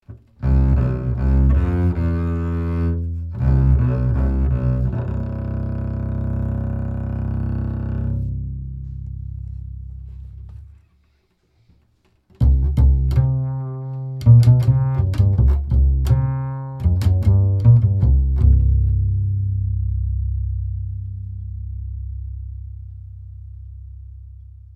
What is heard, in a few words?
upright bass mtp440 dm; lewitt